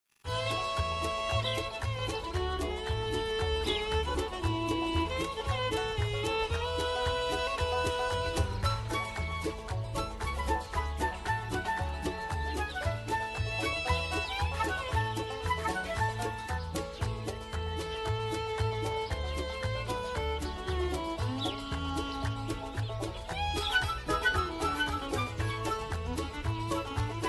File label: BGM Normal; Thanksgiving Blast